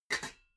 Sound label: pots clank quiet